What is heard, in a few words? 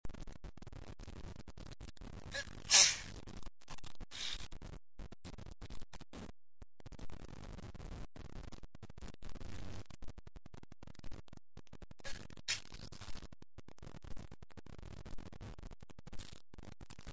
some stifles, male